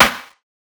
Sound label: snare; dough-samples; eddyflux